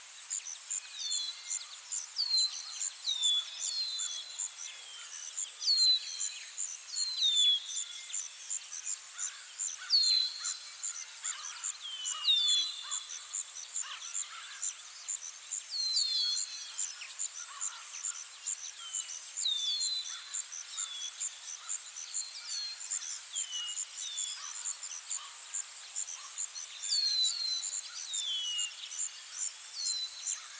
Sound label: Forest; zuid-seeland; visitdenmark; SFX Producer